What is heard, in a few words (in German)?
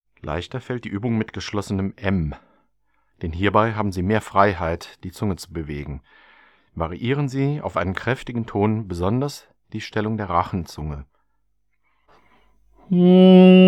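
Nasen Obertöne auf M; Buch Oberton Singen CD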